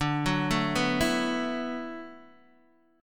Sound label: D 6th Add 9th D, Guitar, Open D